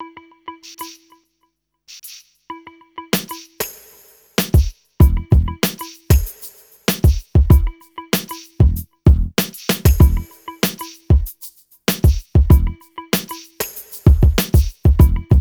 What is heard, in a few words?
MIX, Hip Hop R n B; USB Soundscan vol.19; AKAI